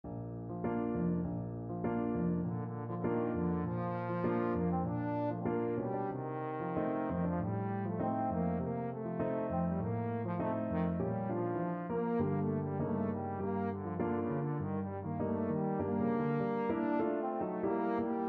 tango, trombone

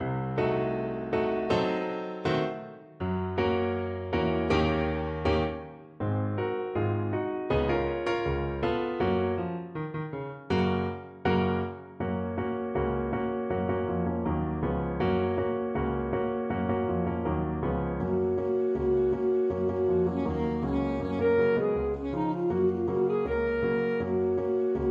saxophone

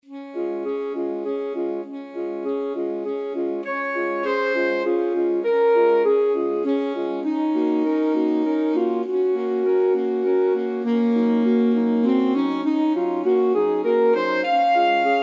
saint saens swan, alto sax quartet

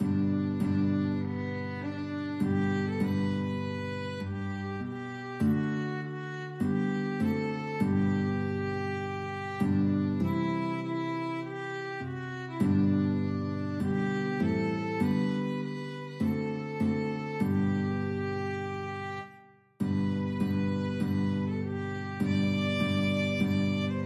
violin guitar, duet; saviors love

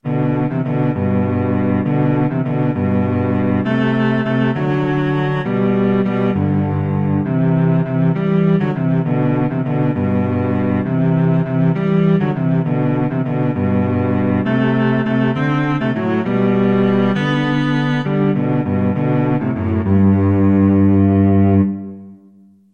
silent night cello duet

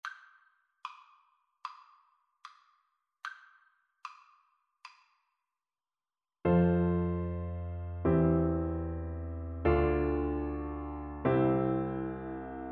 all hail the power, Trumpet

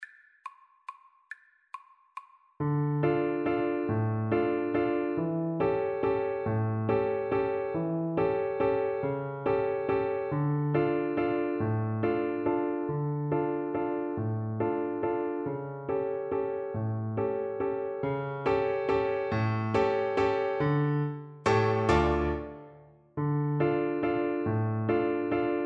dios nunca FL kar, Flute

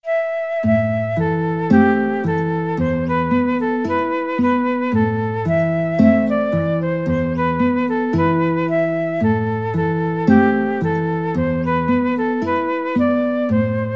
guitar flute duet

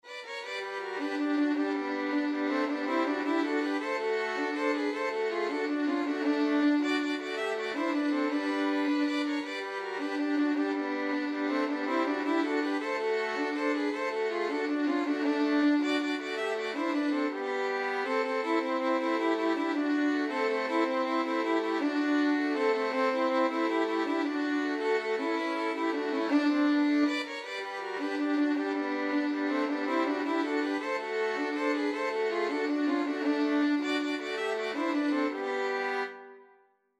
violin trio; mexican hat dance

